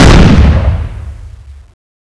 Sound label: cannon; fallen titan